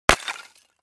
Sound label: Clay Chimney Vent Hit, concrete & brick